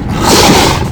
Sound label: bloodsucker attack